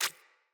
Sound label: Root Spider Step Water, Foley; Animals